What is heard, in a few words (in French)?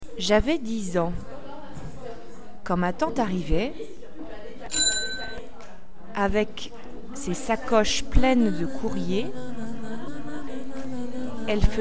sonnette; pad